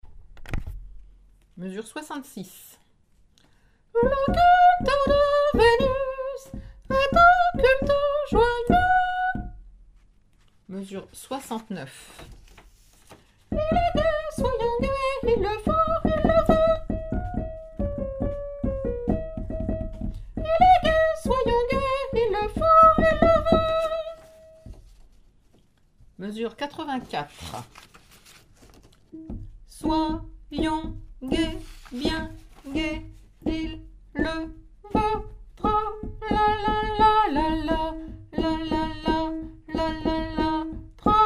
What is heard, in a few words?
soprano, chorale